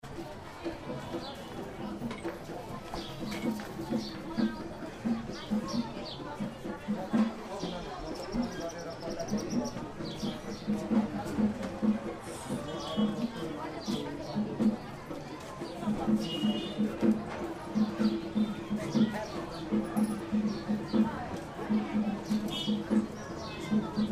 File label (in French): Bhaktapur Bisket Jatra bruits rue percu